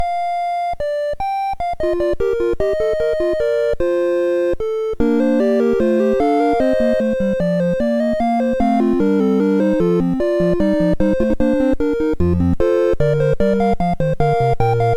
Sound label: Fugue B, MOS6581R2